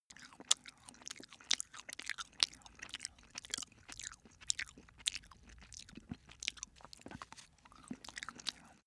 cat eat sequence

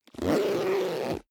zipper backpack close

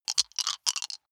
big three rattle in hand closed short

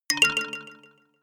notification kalimba delay fifth up

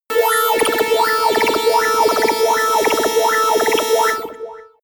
alien synth

